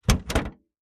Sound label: SUV hood release; trunks & hoods